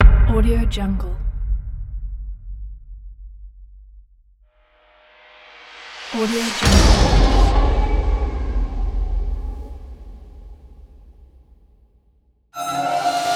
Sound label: cinematic sfx